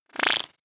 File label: data transfer splutter